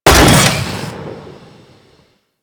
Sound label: Saw Blade Launcher Fire; Warframe